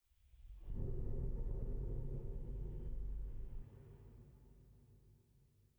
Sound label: sting metal groan; Rust